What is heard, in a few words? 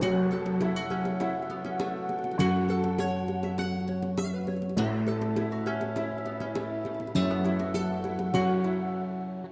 BGM double up; slots; Sushi Suprise